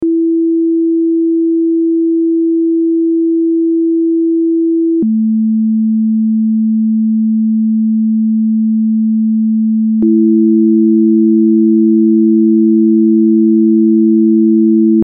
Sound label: 330 220hz mix